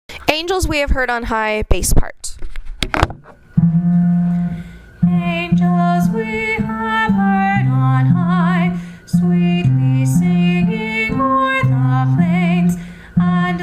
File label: angels we have heard on high bass